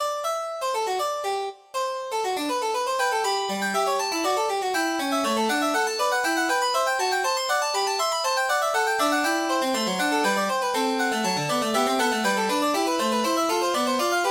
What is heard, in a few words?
Partita, piano